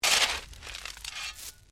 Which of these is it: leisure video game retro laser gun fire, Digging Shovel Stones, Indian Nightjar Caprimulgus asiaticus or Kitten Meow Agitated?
Digging Shovel Stones